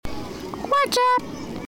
whatsapp; pitufo

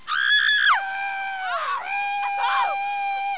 people

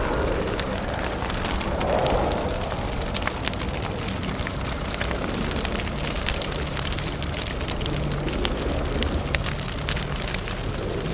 brush fire

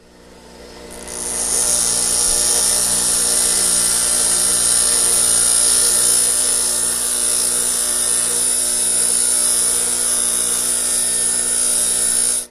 metal grinder

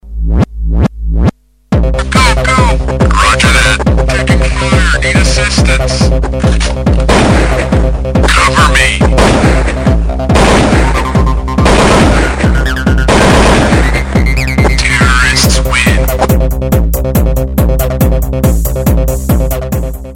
remix; counter strike

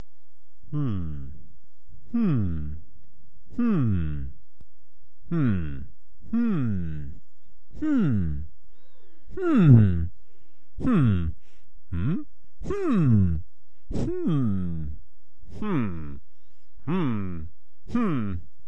hmmm

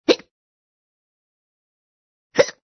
HUMAN HICCUP

